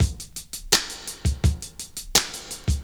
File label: OLD SCHOOL, Breakbeats; USB Soundscan vol.46 - 70 s Breakbeats; AKAI